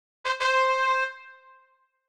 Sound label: tada; Jump Game